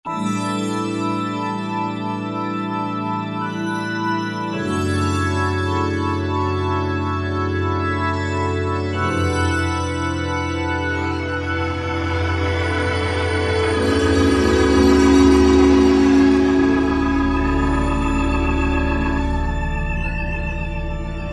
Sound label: Magical Organ